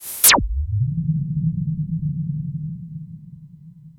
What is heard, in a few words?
Super Bass Station, Filtered Noise; noise hiss crackle